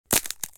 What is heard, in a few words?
Wood crack